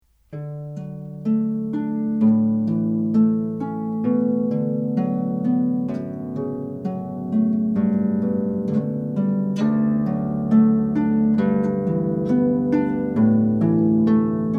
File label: Canon in D, harp